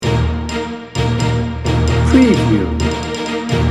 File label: loop